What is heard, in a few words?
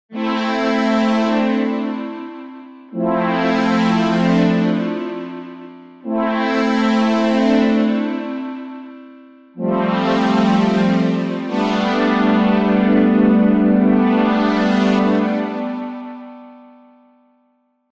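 Korg Prophecy VST Poly Strings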